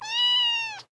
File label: Myaaaa; Neko Atsume